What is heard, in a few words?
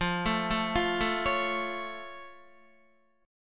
F Augmented Guitar; arpeggiated; 12,12,9,10,9,9; down; 4F,4A,5C,5G,5C,6E